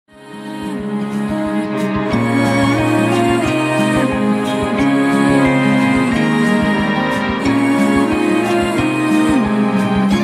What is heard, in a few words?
samsung galaxy s v ringtone